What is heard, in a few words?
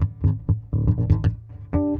Bb 120 Funk Rock